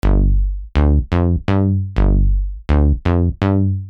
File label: Bass Without Sidechain